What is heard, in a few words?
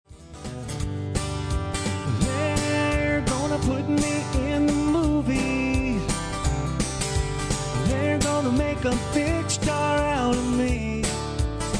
LIVE, Acoustic Guitar